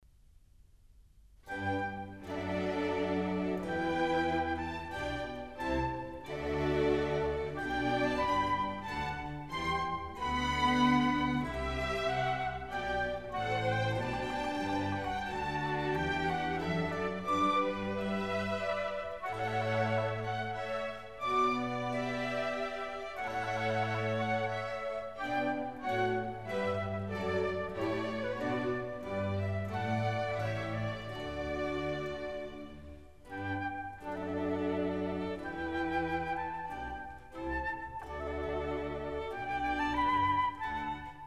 Water Music Suite

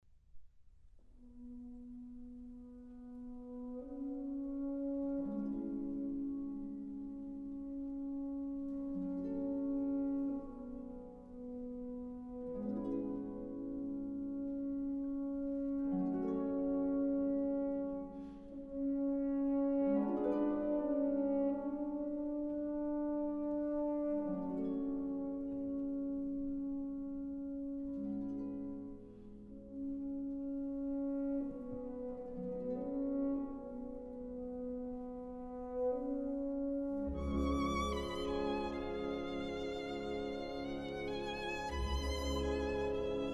Symphony in A minor; Rachmaninov; Symphony No. 3

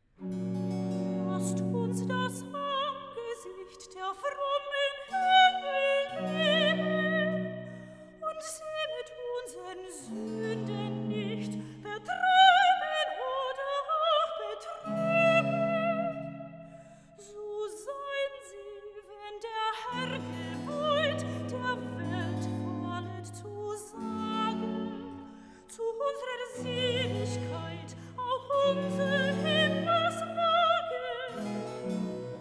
Recitative; Es erhub sich ein Streit, BWV 19; Lasst uns das Angesicht (Soprano); Bach; Masaaki Suzuki

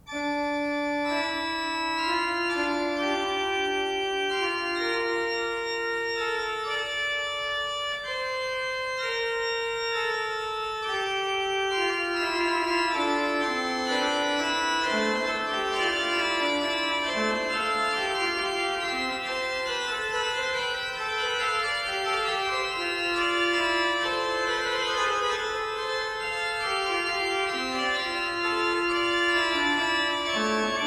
Fugue in D minor, Great Organ; Toccata Fugue in D minor, BWV538 Dorian - II. Fugue; Bach; Bach Great Organ Works; Johann Sebastian Bach

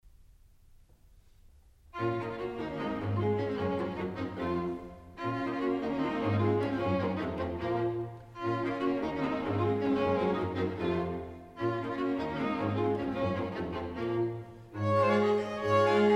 String Quartet in B flat

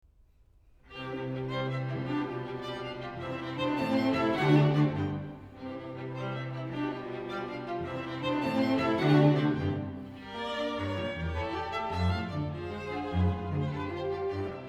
String Quintet in C Major, Scherzo (Allegro); Op. 29; Ludwig van Beethoven; Beethoven - String Quintets Op. 29 & 104

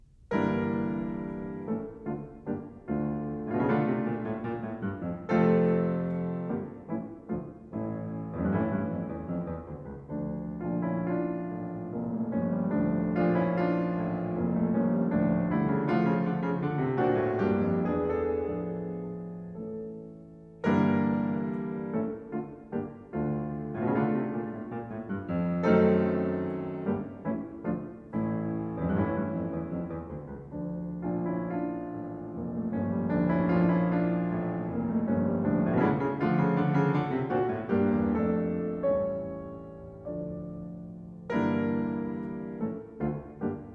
Prelude in D minor, piano; 24 Preludes

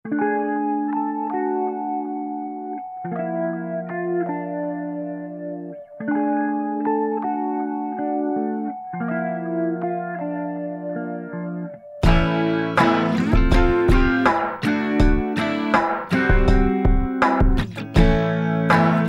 Mellotron Scratch

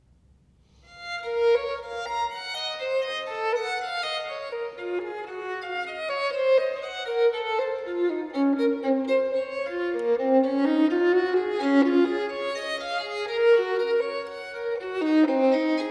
Partita in B minor, Violin Solo; Partita nr.1; BWV 1002; Bach; Sonatas & Partitas for Violin Solo

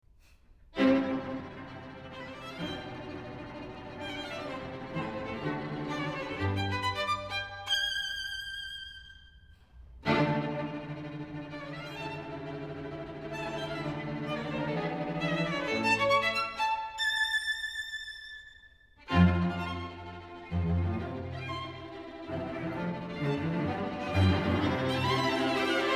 String Quintet in C Major, Presto; Op. 29; Ludwig van Beethoven; Beethoven - String Quintets Op. 29 & 104

